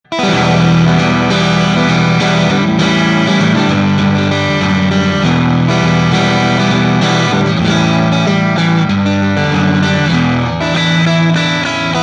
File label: Crunch Neck